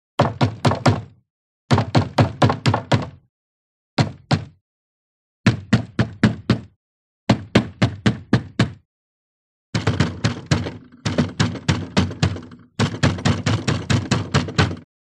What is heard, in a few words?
Knock On Wood Door; knocks